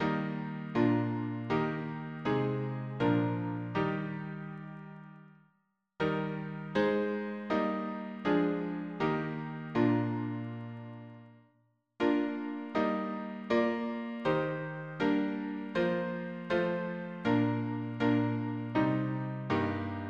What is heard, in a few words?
hymnary harmony piano